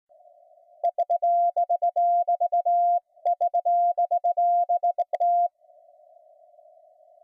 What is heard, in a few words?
Sound 20mm Crystal